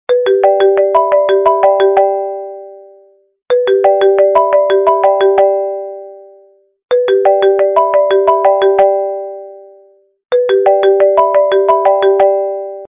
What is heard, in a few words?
ringtone; 3CX